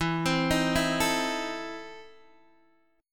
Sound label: E Major 7th Suspended 4th Sharp 5th, Guitar, DADGAD